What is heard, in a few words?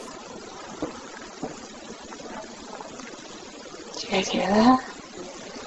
2 knocks, EVP; The Hoffman Mansion EVPS 2011; Hoffman House Evp 12; Spirit Chasers of the Unknown